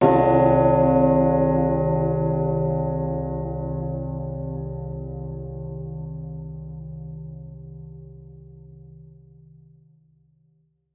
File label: Tension Builder